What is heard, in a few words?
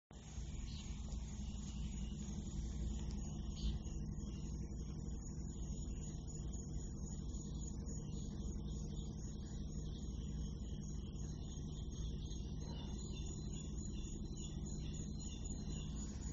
Train